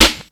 Snare, Drum&Bass; 90 s Sample CDs; Total Drum&Bass

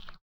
slip slap; Spectrasonics; Metamorphosis

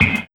Spitter Snare, Esoterik Beatz; Spitter Kit

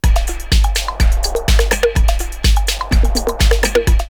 drumloops Rave 125; X-Static Goldmine; 90 s Sample CDs

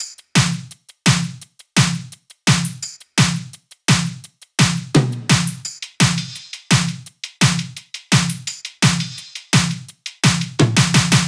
loop top 170, Seismic Loops

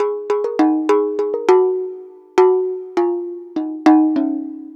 Jug Hi Melody

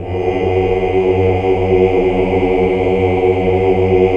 OOH, Ooh Uni; Sound & Vision - Gigapack II CD 1 (Roland)

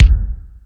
Lotsa Kicks, Drum Machines

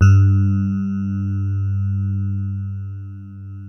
DELICATE, DX RHODES

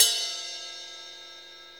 CYM Ride; Best Service Gigapack 2

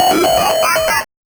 Noise, 8-bit, Bitty Noises

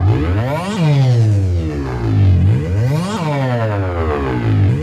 STARTER; AKAI Anime Sound FX; SCI FI

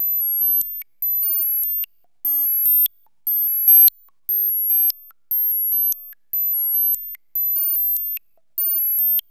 RHYTHM ODD

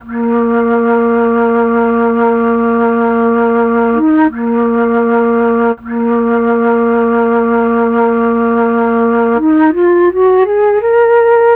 Flute, Cinematic Funk; Sonic Foundry (Sony Creative Software); Cinematic Funk Urban Tone Poems

